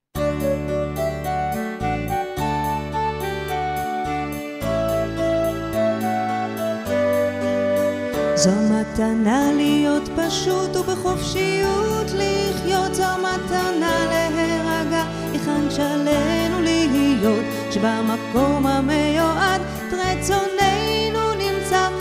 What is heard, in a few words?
Hebrew 'Tis The Gift To Be Simple